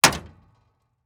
wall metal; Golden Crayon Sounds

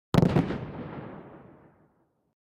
aircraft shot fire dist; Original TK Sounds

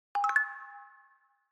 Salient Notification Haptic, iOS Sounds

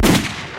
gun; RS Games Sounds; Monopoly

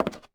plywood step; Golden Crayon Sounds

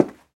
carpet step; Golden Crayon Sounds

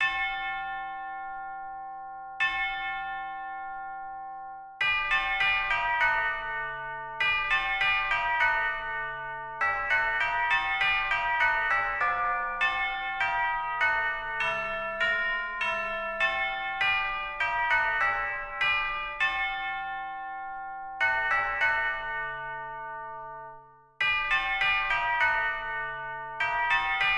lost bells, music; Manamon Music; Manamon 1

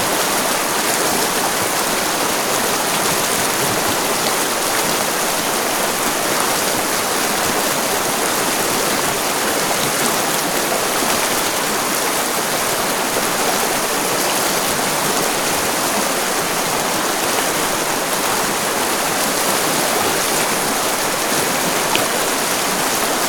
waterfall; Golden Crayon Sounds

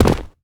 dirt fall; Golden Crayon Sounds

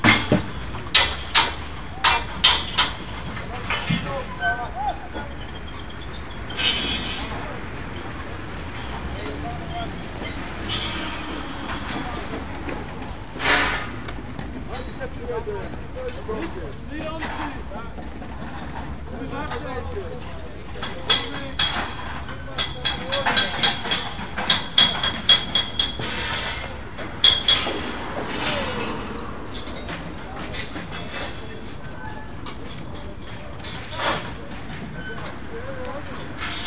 construction; Golden Crayon Sounds

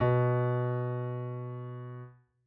piano b; Golden Crayon Sounds

